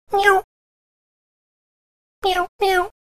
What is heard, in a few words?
Cat Meows Short Cat; animals cat